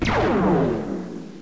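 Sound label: space gun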